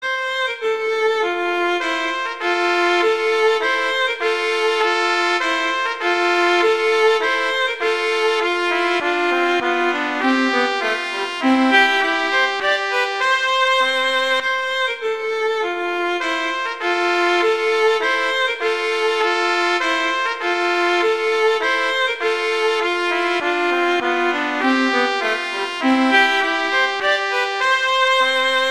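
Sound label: Duet, horn; Mozart; Duets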